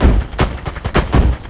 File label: LOOP, 160 BPM, Drum & Bass